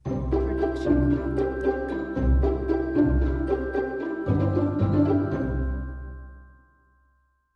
Friendly Ident With Bells; SoundsCrate

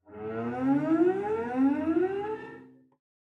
Cello Slide Up; SoundsCrate

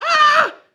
man scream fear; soundscrate

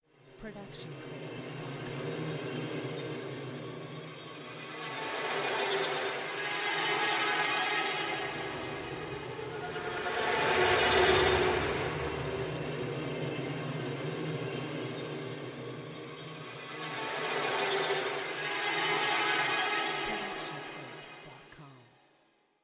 dissonant string swells; SoundsCrate

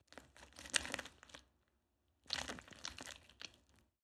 squishy long; soundscrate

